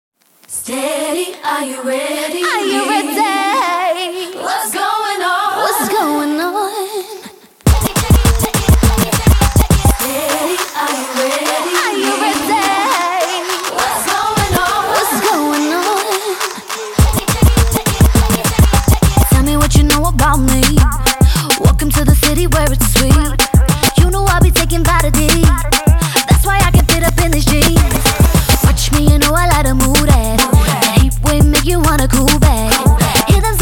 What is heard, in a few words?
cheerleaders mix